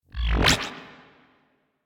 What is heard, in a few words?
Jukebox Enter, UI; DE; Warframe